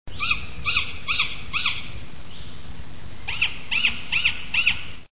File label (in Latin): strix nebulosa